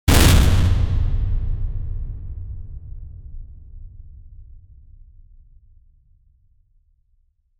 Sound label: big heavy metal impact